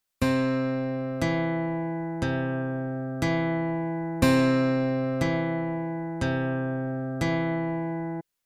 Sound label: adding to beat, guitar; beginner finger picking techniques